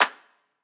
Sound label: clap one shot, Pop drums; Slate; SD PASADENA Pop Sample Pack